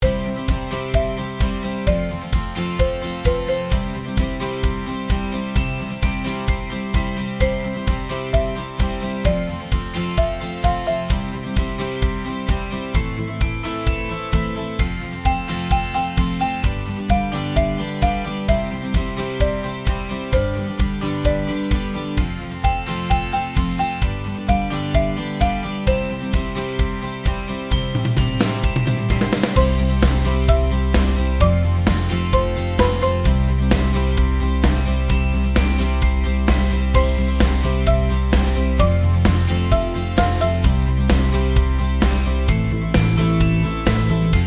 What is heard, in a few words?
happy; ちょっとアップテンポ。アコースティックギターと鉄琴・木琴。